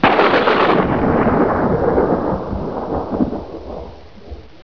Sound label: lightning